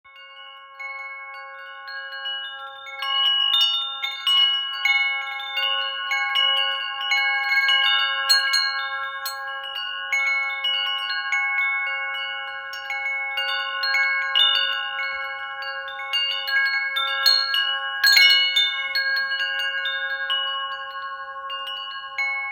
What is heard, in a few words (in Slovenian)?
Vetrni zvon Om